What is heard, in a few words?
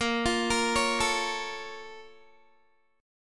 Bb Minor Major 7th, Guitar; BbmM7 arpeggiated